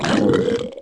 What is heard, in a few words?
gurp; quake2; morticus